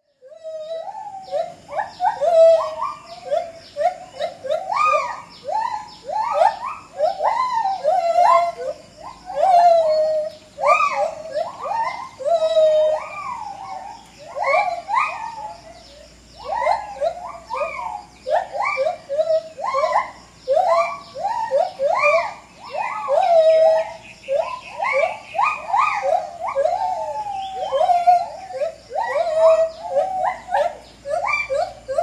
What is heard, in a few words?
gibbon